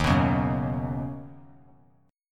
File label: Eb Minor 7th Sharp 5th Eb; strummed